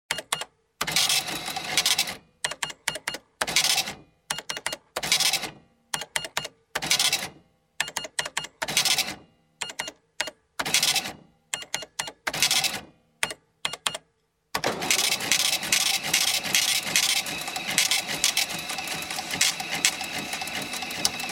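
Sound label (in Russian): электронный кассовый аппарат добавление записей итого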